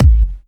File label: Hard Kick; Vengeance Samples